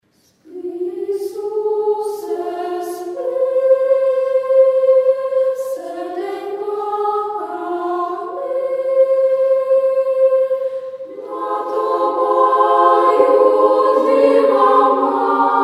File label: children choir